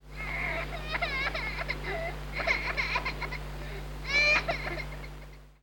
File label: cry